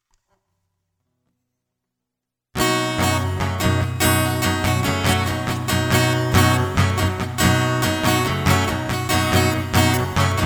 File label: Guitar Loop